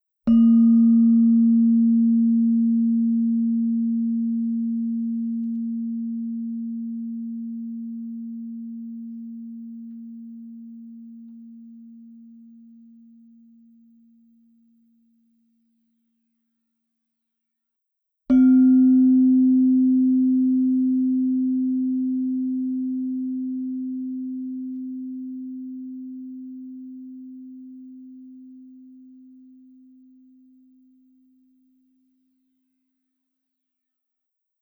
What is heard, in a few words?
gamb gangsa pl, gamelan; sounds of mtb